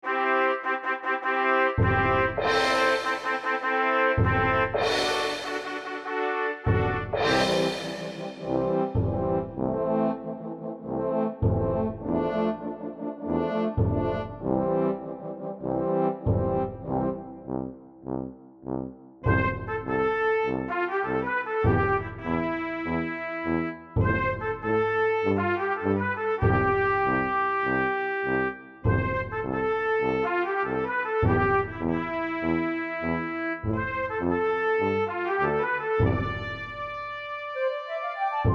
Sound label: MIDI Ditty; spellman studio